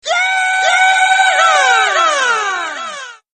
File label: Cowboy Yell